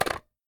spin end; tetrio

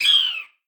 finesse fault; tetrio

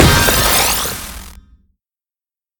clear spin; tetrio

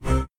social close; tetrio

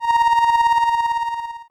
hyper alert; tetrio

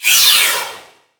garbage in large; tetrio

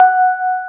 combo power; tetrio

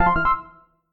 pause retry; tetrio

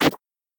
menu click; tetrio

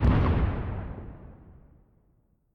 detonated; tetrio

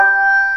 combo; tetrio

